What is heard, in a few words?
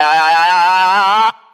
"ah", PITCH UP